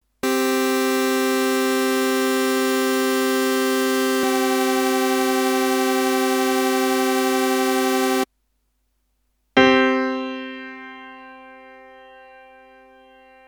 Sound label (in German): wolfsquinte; harmonie